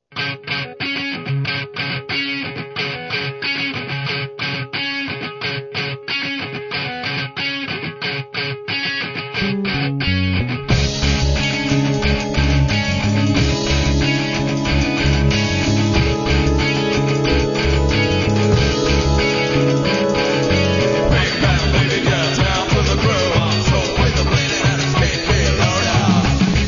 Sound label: cello; cellophaned